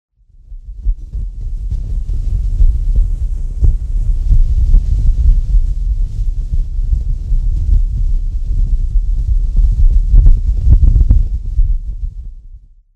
TORCH HEAVYWIND

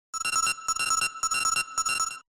computer intrusion detection sound

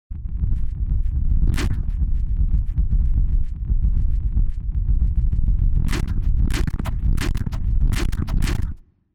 THUNDER DOOM

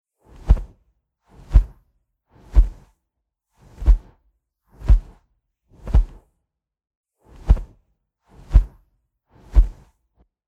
Griffin

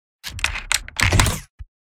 ROBOT SMALL

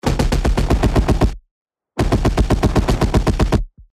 Assault rifle

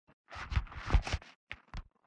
grabbing

